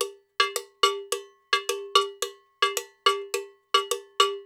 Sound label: Percussion, 108; Sampleheads; NYC Percussionworks; 90 s Sample CDs